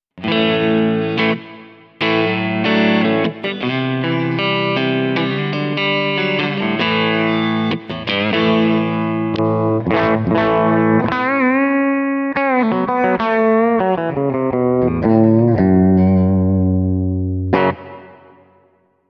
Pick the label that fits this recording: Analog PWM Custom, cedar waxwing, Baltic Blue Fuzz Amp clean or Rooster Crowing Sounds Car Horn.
Baltic Blue Fuzz Amp clean